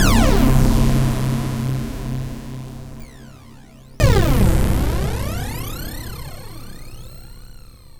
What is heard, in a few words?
Future High Glow, Synth SFX; Sonic Foundry (Sony Creative Software); The Electronic Music Manuscript Collection